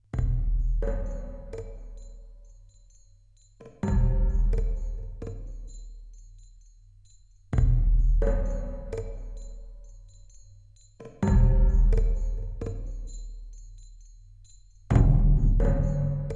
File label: Percussion; Age of Conan Hyborian Adventures; Kushite Plunderers (Purple Lotus Swamp Percussion)